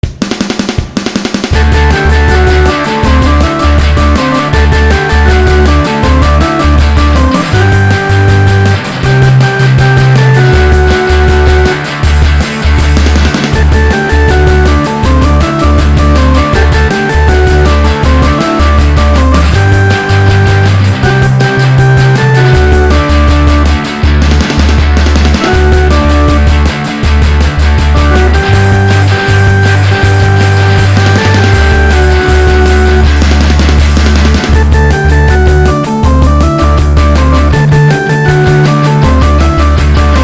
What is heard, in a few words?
Guitar; Cutie Mark Guitar Crusaders